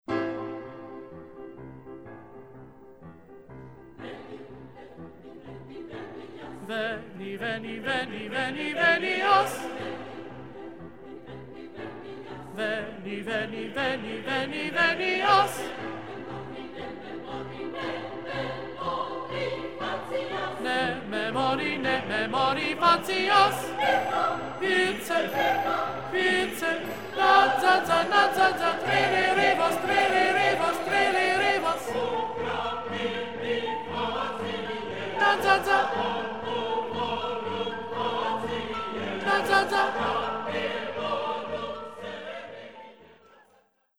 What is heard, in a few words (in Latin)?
Veni veni venias Coro II Tenor Slow